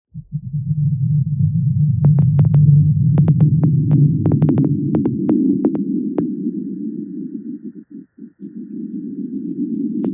geiger counter insects

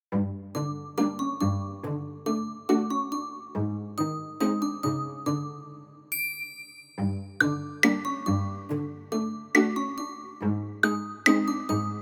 cartoon pizz and sneaky

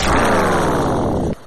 sonic laser fire

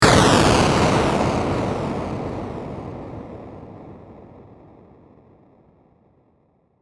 game explosion

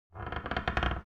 short creaking floorboard

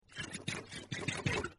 eating effect

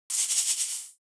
rattlesnake moving the tail

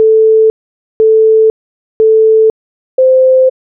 sport start bleeps

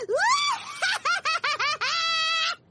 laughter; paimon

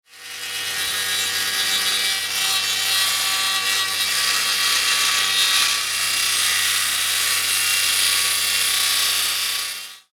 circular saw

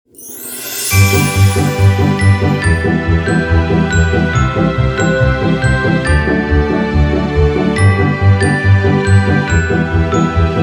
christmas bells logo

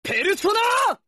yell; joker persona

